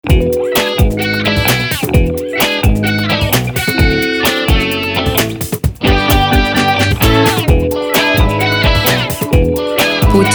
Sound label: happy stinger